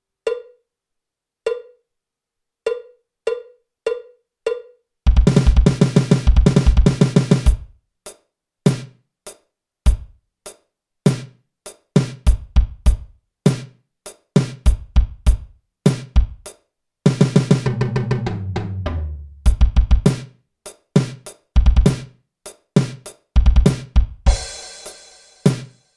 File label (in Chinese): 架子鼓独奏 硬摇滚; 66条架子鼓独奏SOLO